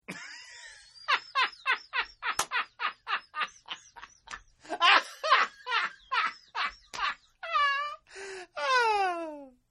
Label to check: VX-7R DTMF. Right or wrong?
Wrong